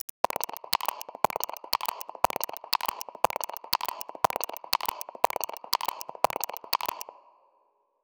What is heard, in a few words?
three step ascending elec